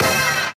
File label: super Meter Bet Level; mega joker